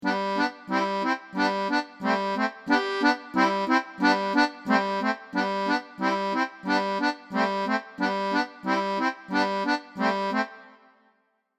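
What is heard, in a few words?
accordion; garmoshka; maslenitsa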